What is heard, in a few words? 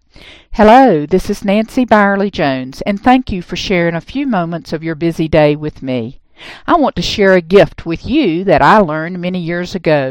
deep breathing